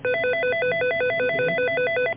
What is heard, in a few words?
ring